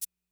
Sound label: Maraca Accent, 808